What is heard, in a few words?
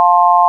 sine; ものづくり基礎工学（情報工学分野）; 音の波形と分析